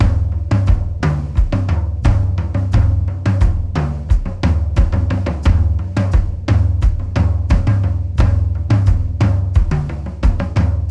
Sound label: 88bpm rock drum loop; Hayman